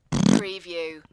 Fart

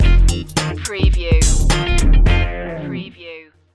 shortcut groove stinger

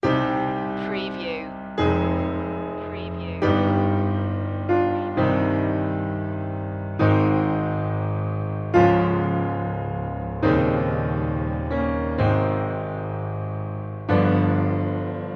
prelude c minor funeral march quiet ending; opus 28 no20; classical music for media

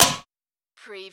Button Sound - Metal Ding

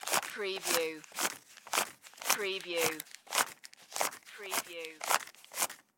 Money Count Bills

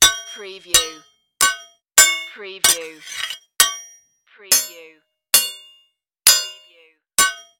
Sword Fight; Action, Impacts & Explosions

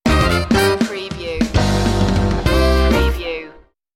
funk cake stinger

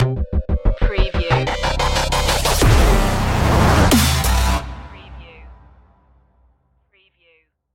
dubstep of frying cpu stinger

